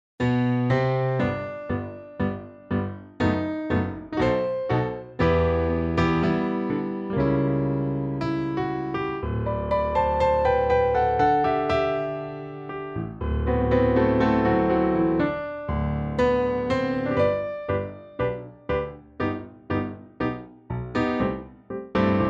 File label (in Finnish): Tango G duuri